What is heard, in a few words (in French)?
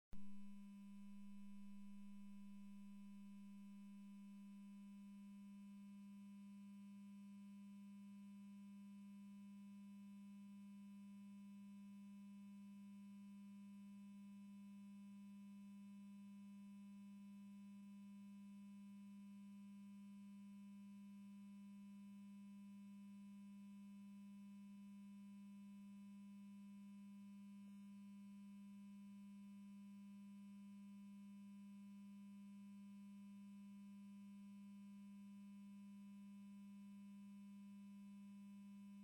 sinus 200Hz hors phase; test bluetooth